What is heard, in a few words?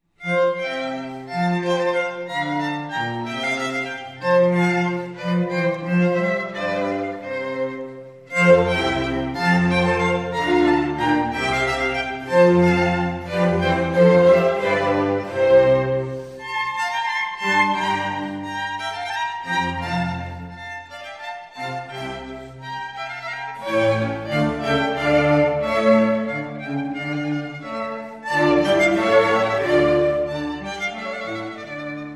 Concerto for 4 violins cello strings & bc; in F major; Concerto No 7; Antonio Vivaldi (PD)